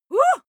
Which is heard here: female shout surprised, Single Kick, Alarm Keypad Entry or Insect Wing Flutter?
female shout surprised